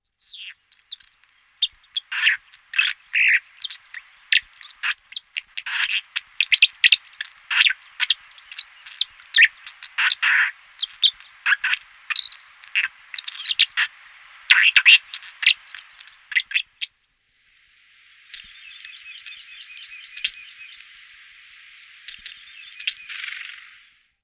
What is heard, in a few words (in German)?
wasseramsel, gesang; singvoegel; roche 2004